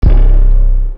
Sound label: Not Nice 808's